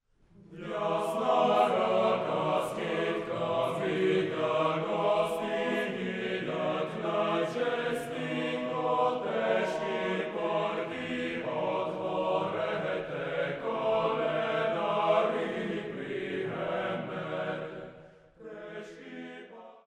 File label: Koledari, Boys' Choir; Christmas Songs; Коледари, м. П. Стайнов; P Staynov; Sofia Boys' Choir